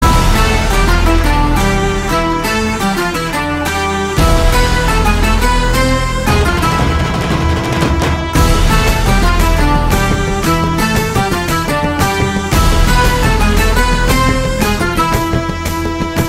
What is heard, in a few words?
Karaoke Bgm